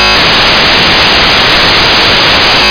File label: BALL FEED